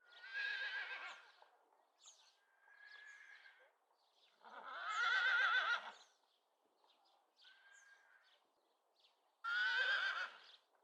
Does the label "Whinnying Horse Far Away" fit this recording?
Yes